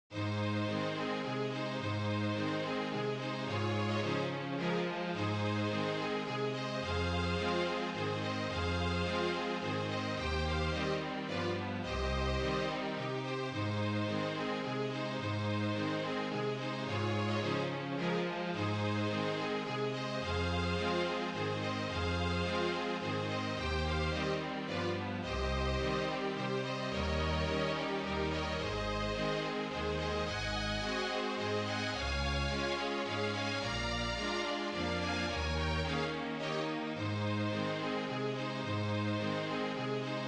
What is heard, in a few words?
MIDI Waltz Quintet; Brahms Waltz Quintet; Mixed Quintets